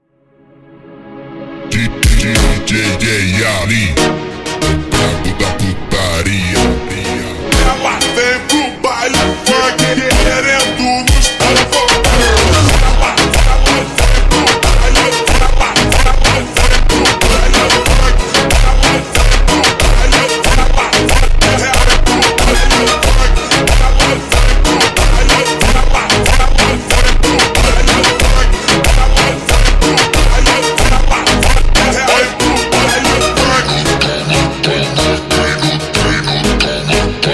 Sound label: Montagem ultra Slowed